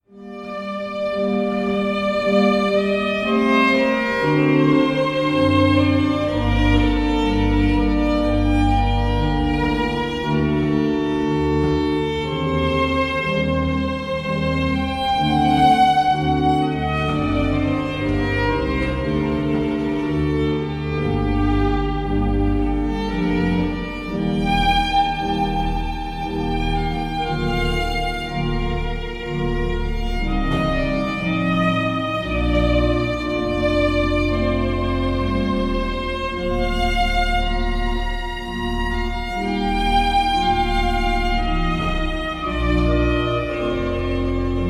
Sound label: Sarabanda; Tartini